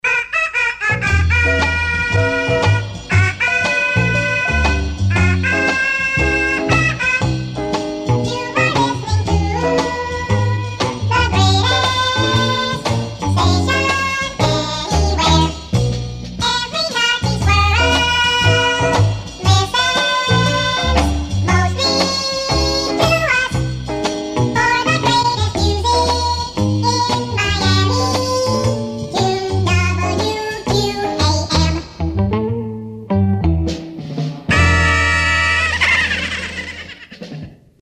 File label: Chipmonks; WQAM; JingleMill